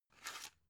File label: drug blister pull out; berufe; arzt